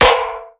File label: toilet bullet hit